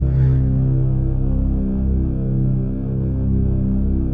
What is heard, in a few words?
PAD; Best Service; XX Large; Pads